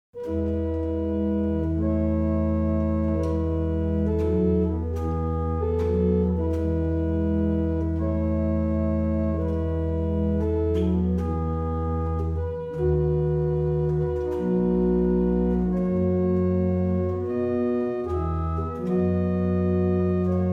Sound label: Sicilian Mariners, barrel organs; Witton